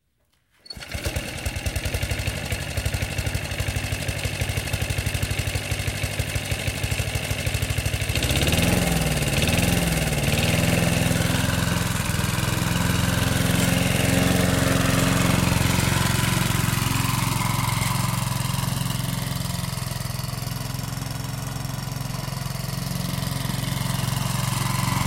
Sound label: Petrol Mower; 100 sound effects